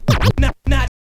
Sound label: scratch kit, vinyl